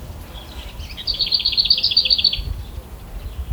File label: lesser whitethroat song